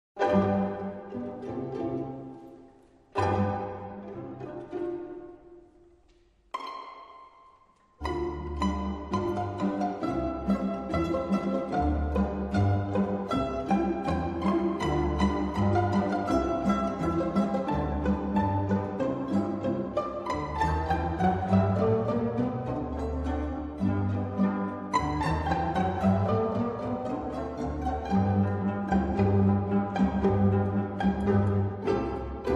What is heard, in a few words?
pizzato polka; J. Strauss